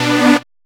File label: Synths H; Vengeance Samples